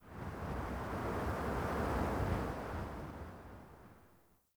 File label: Fort Wind Gust Soft Buffet Airy; Ambience